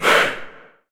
gorilla mask land; gorillakingdom

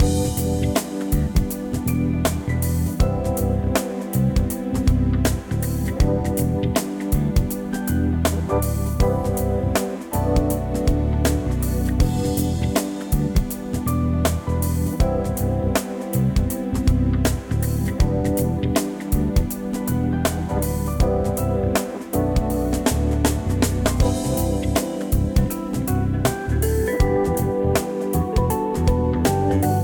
ambience Music; frenchroulette3

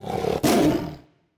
panther fx high; serengetikings

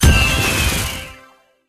supermeter Sound; jackpot 6000

